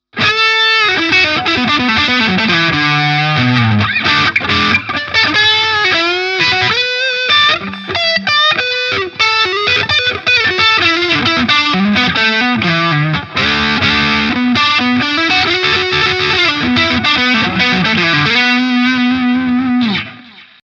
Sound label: blue 50 Gain mi lead hals